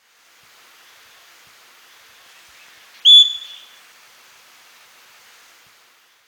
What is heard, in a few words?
Pionus maximiliani whistle; Ybyturuzu